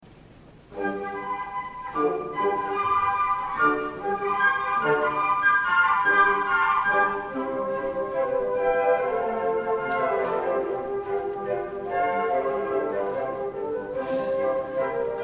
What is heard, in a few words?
concert, flute; arietta-flute